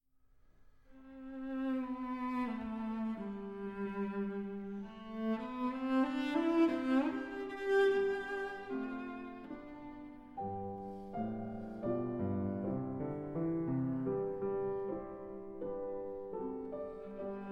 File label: Sonata in C Major, Andante - Allegro Vivace